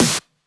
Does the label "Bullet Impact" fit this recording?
No